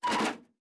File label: error